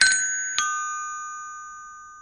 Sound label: ding dong